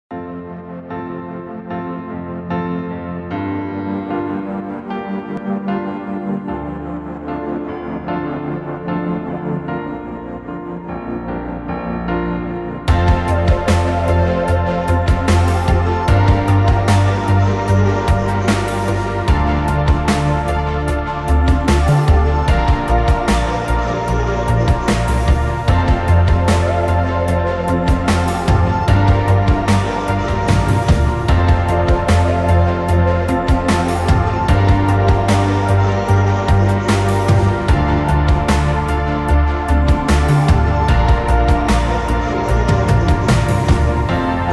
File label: romantic